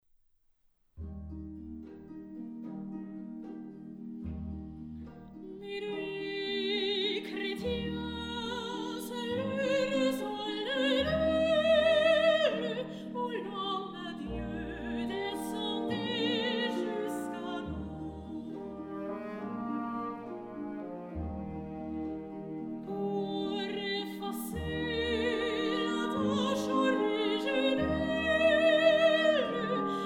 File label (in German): Cantique de Noël, Chormusik der Romantik